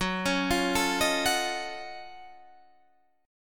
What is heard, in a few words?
F sharp Minor Major 13th, Guitar, Standard; arpeggiated